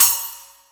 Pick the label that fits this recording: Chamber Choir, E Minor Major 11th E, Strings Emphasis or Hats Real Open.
Hats Real Open